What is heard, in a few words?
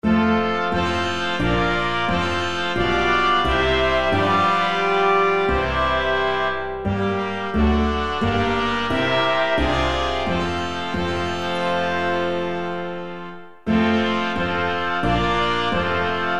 bigband